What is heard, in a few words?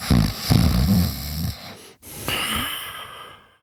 Snores, Son, Vocalizations; Warframe; Deimos